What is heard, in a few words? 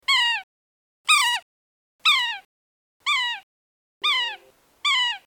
sapsucker